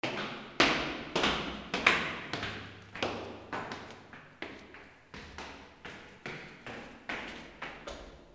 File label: FOOTFALL